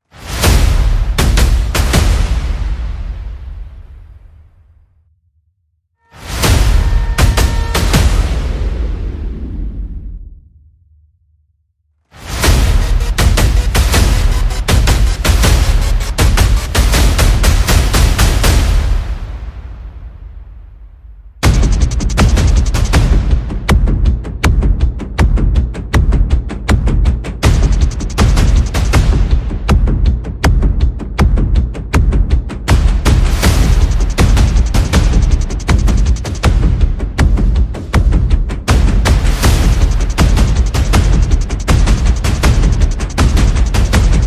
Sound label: Percussion and Effects